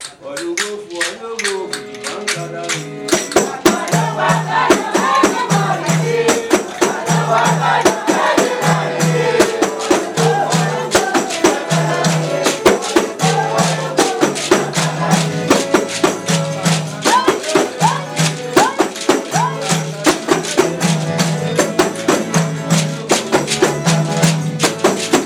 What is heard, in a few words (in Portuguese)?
fanti baiao de princesas batalhao; Baião de Princesas é um ritual especialíssimo e único que acontece apenas uma vez ao ano